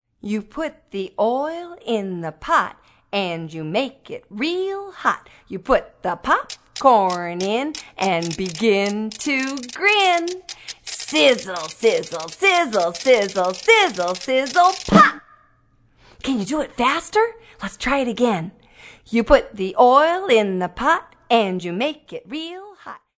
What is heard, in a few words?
Popcorn Chant